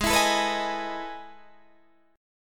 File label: E Major 11th chord